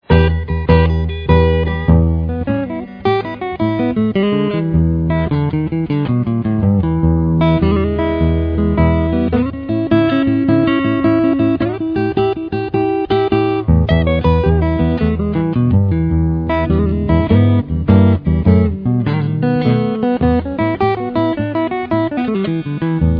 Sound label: blues guitar